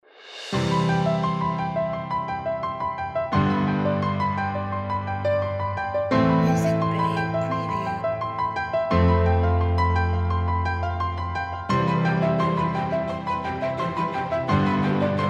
Uplifting Inspiring and Motivational Piano

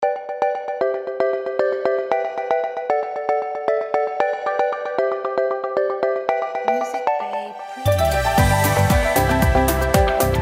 Corporate Logo

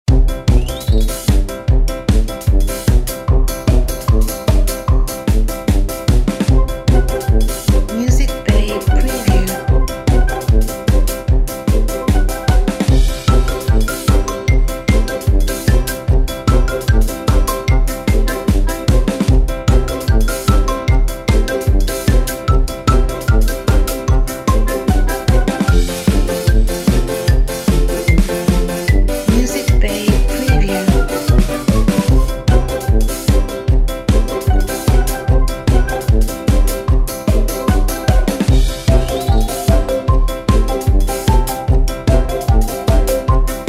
Funny Game Loop

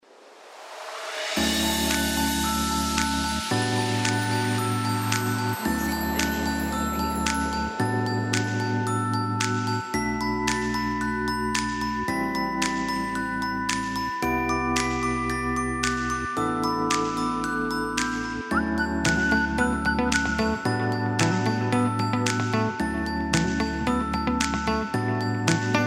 Jingle Bells Chill Funky House

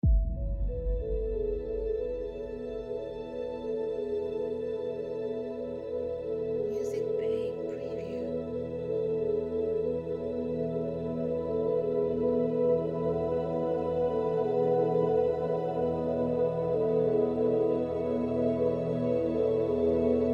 Ambient Soundscape

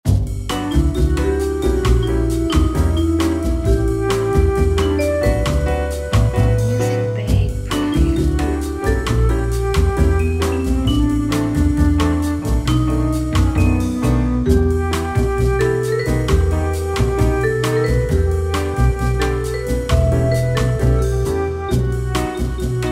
Elevator Bossa Nova

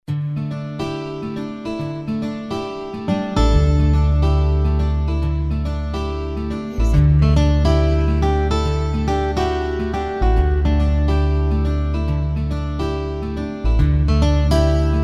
Feeling Melancholy, ambient

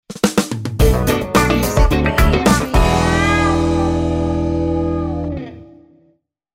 Show Ident

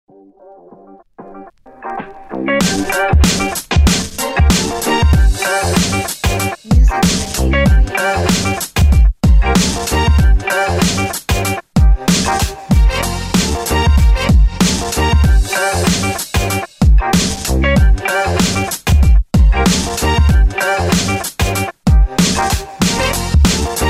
Hip Hop

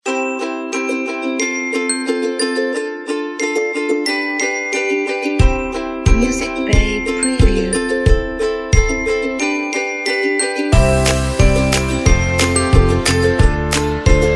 Cheerful Happy Folk Ukulele

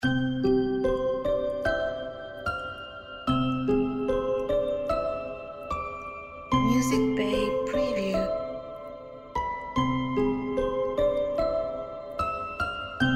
Raindrops Lullaby

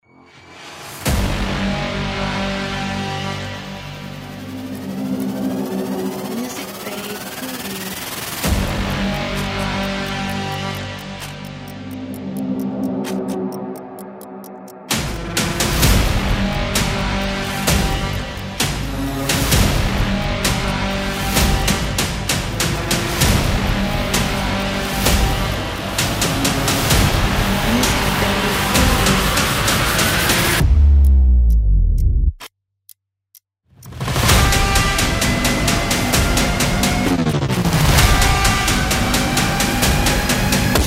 Aggressive Hybrid Trailer

